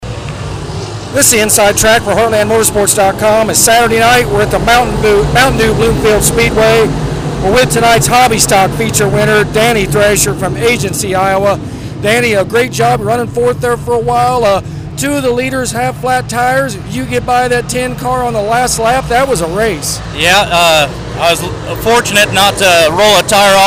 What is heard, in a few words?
Hobby Stock; 5-5 Bloomfield